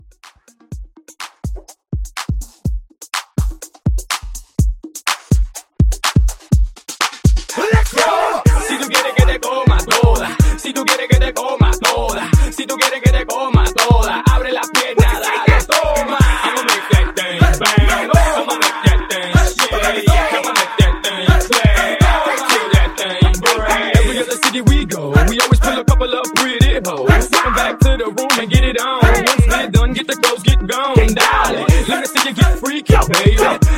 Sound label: Club Hype, 124